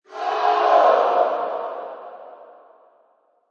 Goal, Basketball Chant Goal. Indoor Sports: Crowd shout; Indoor Sports: Crowd shout "Goal!". Reverberant sound.; Lynne Music